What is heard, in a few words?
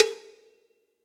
Cowbell Big Studio Basic; Drums; Drum Hits; Standard Hits; Ableton; Factory Packs; Session Drums Studio